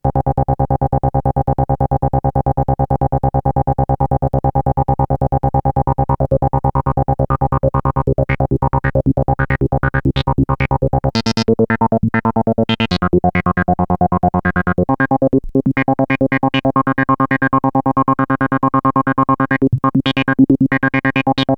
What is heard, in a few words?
SAMPLE AND HOLD, Moog Micromoog